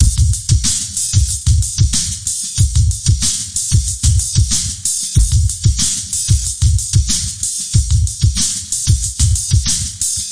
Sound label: 93 bpm stuffy head breaker, drum loops and breaks; 091 to 100 bpm